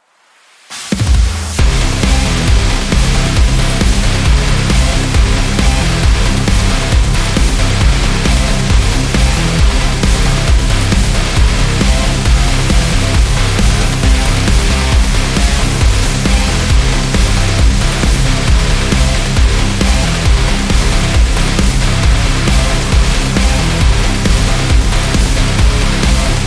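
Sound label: Burning, sport and action music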